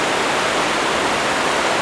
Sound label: Water